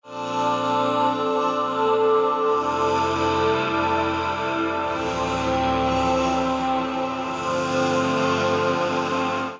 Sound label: Slow Motion Voices A Minor 100 bpm; MIDI Clips; Chromatic; Choral; Ableton; Factory Packs; Voice Box